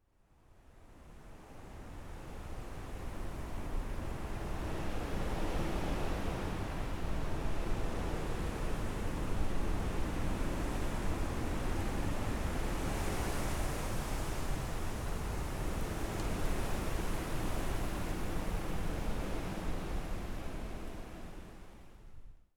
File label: wind; Sound design for the opera composer: concepts and methods